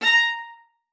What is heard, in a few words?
MART A#5, VIOLA SPICC; Xsample VOL-5; 90 s Sample CDs